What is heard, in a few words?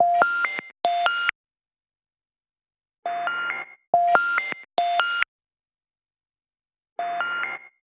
repeater, alarms; Nothing Phone (2)